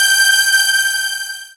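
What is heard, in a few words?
synth dance; Best Service Gigapack 1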